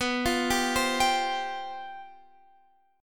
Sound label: C Major 7th Suspended 4th B